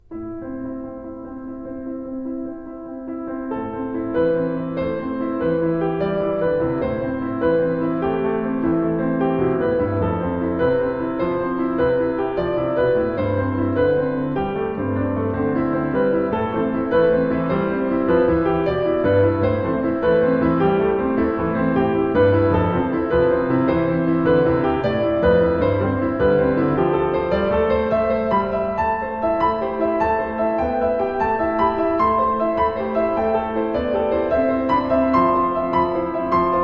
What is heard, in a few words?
piano, allegro